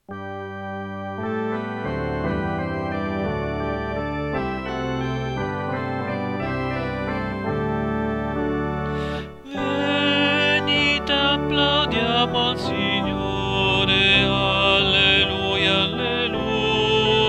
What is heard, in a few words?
base TEN, coro; BASI CORO